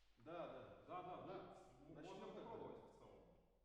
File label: MKH AB, piano